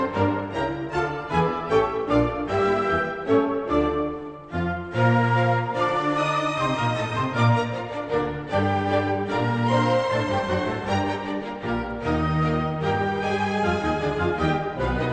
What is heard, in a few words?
classical